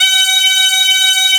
Bagpipe Bb3

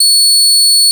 Bb ff Clar Gb6

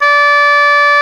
Oboe F#3

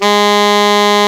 Sax C2

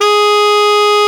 Hard Baritone D3

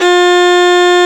Violin Bb2

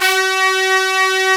Brass C3

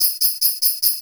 Sleigh Bells

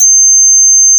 Celesta C7

